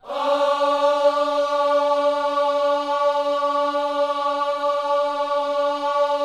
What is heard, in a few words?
OHS D 4, Gospel; Spectrasonics; Vocal Planet Vol.1 Gospel; akai